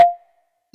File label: Tonal; Synthetic's Serum Collection